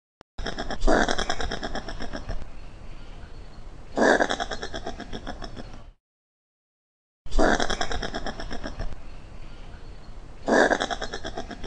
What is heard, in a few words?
opossum; صاریغ